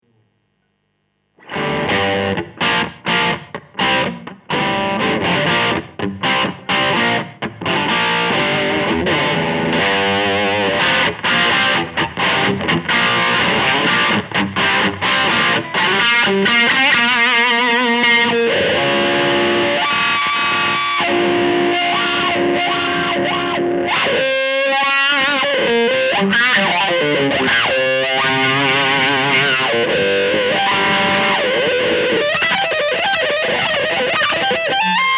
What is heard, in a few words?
Wah